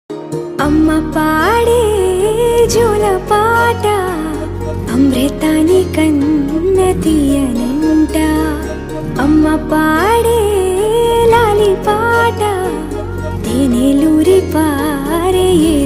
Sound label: song